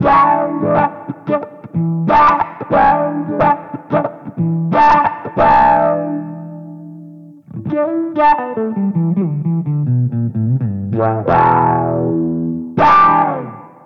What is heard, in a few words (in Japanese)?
Clean RANGE 11時